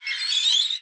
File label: Pole Squeak; Lara; Playable Tutorial Level